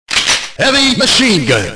heavy machine gun

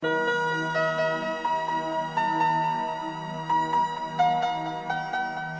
nightmare music

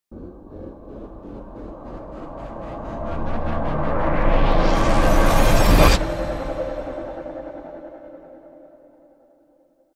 warp sound

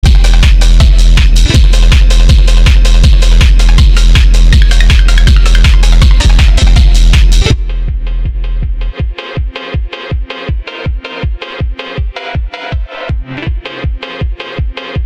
phonk

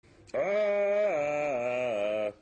aaahahaaa

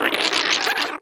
coo

